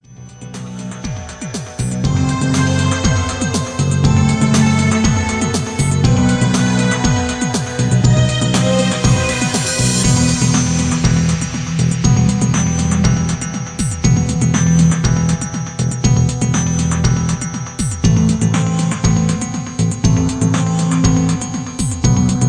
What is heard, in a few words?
instrumental